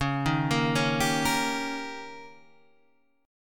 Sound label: Eb 7th